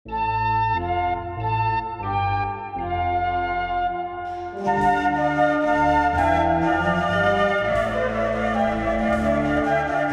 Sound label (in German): feuerwerksmusik, flute